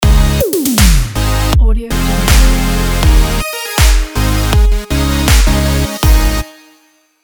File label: simple future bass logo